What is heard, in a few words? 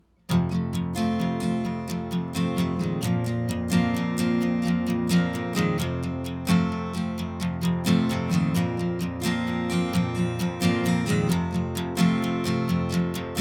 Guitar wet